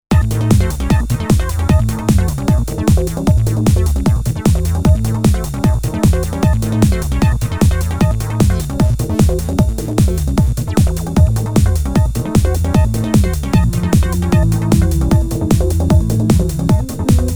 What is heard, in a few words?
Techno